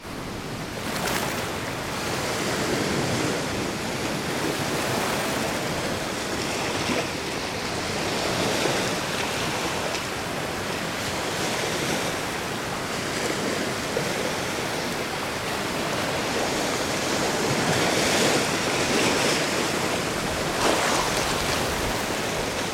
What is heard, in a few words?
Ambientsound World; World of Castles